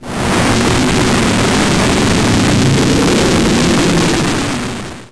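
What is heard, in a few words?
Firestorm, ambience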